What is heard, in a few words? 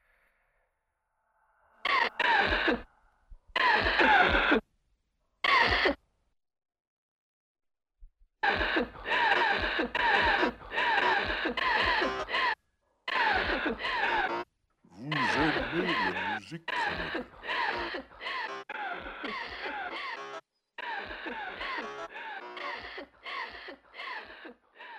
noise; earlabs